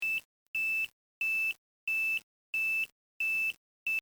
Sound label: Slow Pulse; 2.9KHz Slow Pulse Tone